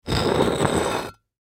Stone Drag Object Statue On Cement; Dragging Sound Effects; Foley; Blastwave

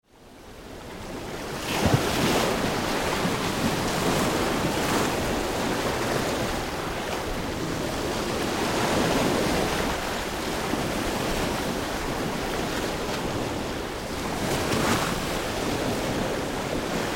Ocean Sea Waves Around Beached Wreck; Nature; Tom Hutchings

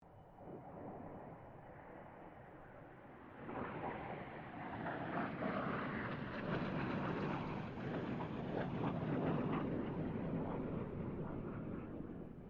AIRCRAFT CA-27 SABRE PASS DISTANT

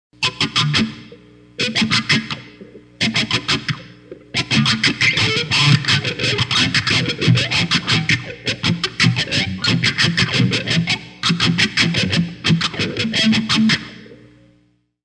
Distorted electric guitar scratches LOOP.; Part of Guitars (Sound Effects:Musical)

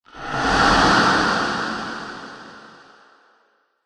Pyramid Phantom, ghost sound effects; Andrew Fleming

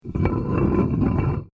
Metal Drag Metal Grind Short; Chain sounds; Metal Sound Effects

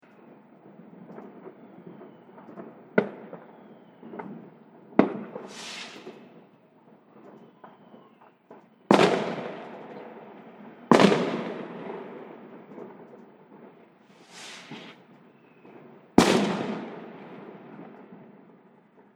Fireworks Distant And Near Display; Firework Sound Effects; Celebration, Party, Holidays

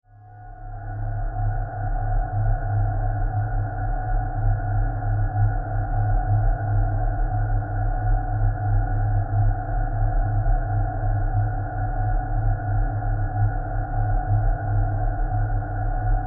Drone Flutter; Drones; Sound Design; BlastWave

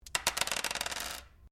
Dice Small Pair Glass Table Throw; Variety of dice sounds; Casinos

